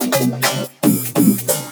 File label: Loops Fillin140; Vengeance Samples; VEC1 Fills